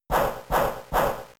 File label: Facade; Pokemon; Gen 3; attack moves; RSE